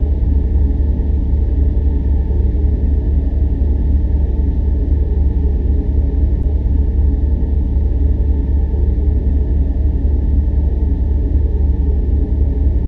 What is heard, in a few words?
engine alien big